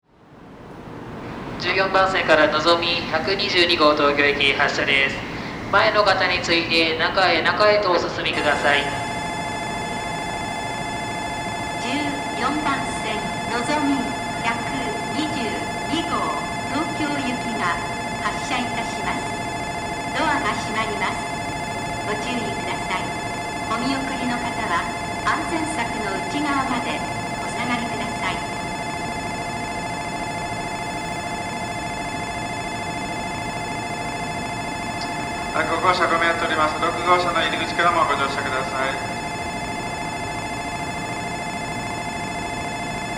nagoya bell; tokaidos; jrcentral

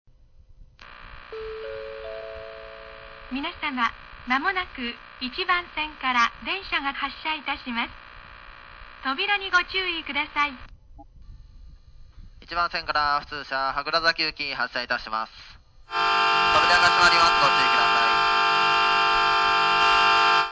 kishiwada bell; 1番線発車放送＋出発時機合図; nankai

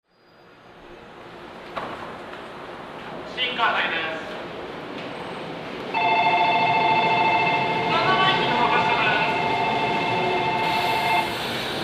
shinkawasaki bell; jreast; yokosuka

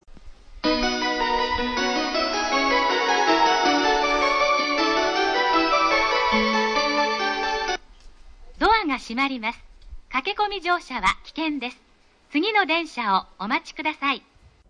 higashihanno melody; hachiko; jreast

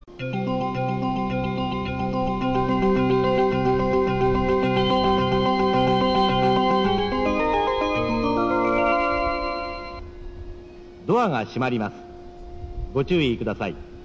Oyama melody; jreast; utsunomiya

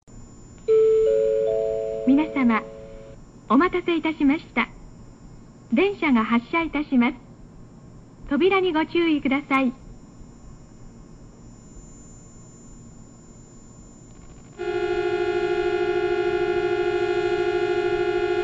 sumiyoshihigashi bell; nankai

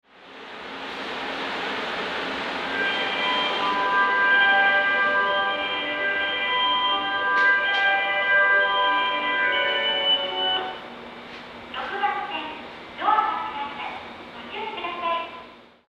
akihabara melody; jreast; sobu

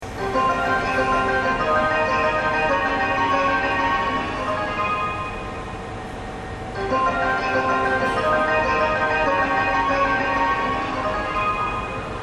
melody, station; nambu; tachikawa; jreast

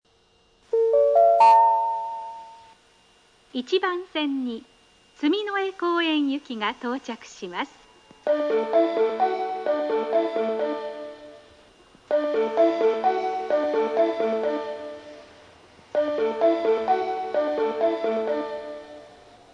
yotsubashi, osaka, station

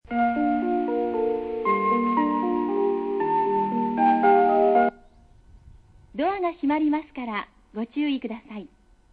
toro melody; jreast; utsunomiya

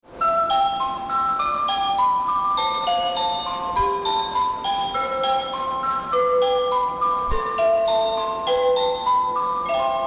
melody, station

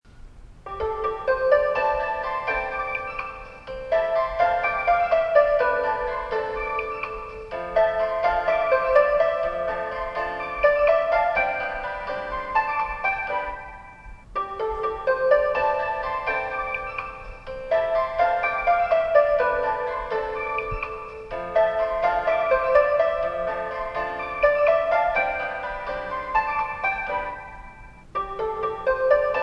melody

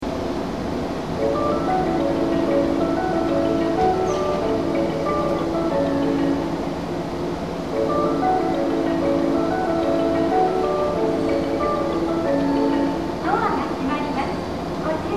melody, station

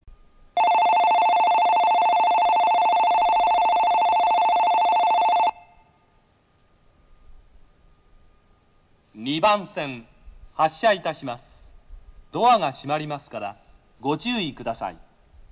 shimbashi bell; jreast; yokosuka

